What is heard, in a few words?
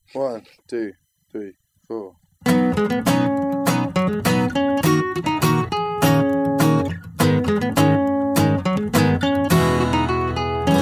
acoustic